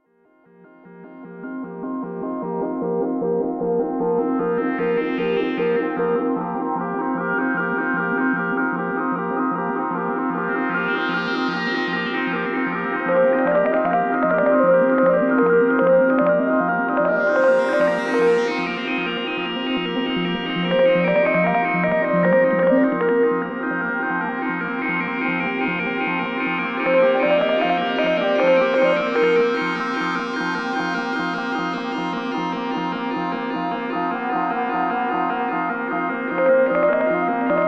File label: Marimba Roll